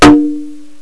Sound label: gakudaiko